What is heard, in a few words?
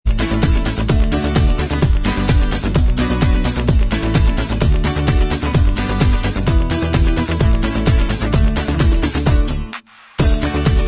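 instrumental track, backing tracks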